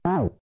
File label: spit